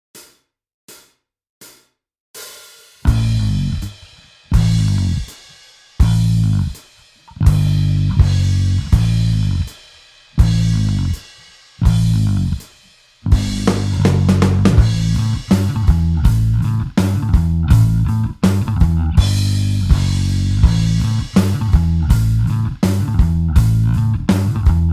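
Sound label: slide rock backing track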